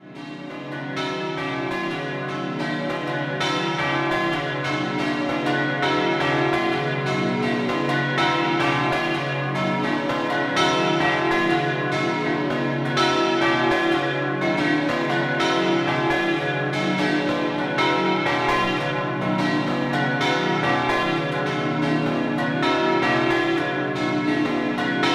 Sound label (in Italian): Gorizia Duomo, campane